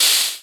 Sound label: zero ammo, weapon